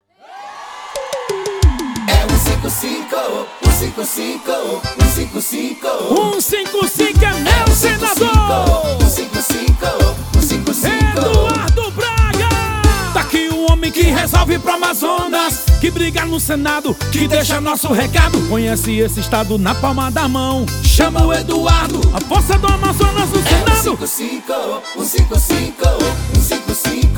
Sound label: JINGLE